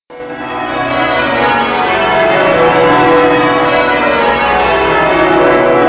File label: bell sounds; redcliff